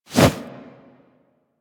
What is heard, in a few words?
Arm Swing Foley Heavy; Enemies; Warframe; Purgatory Warrior